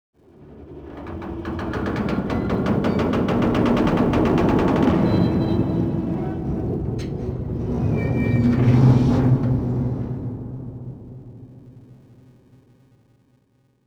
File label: metal stress, cargoliner; Soldier Of Fortune II Double Helix; XBOX; ambience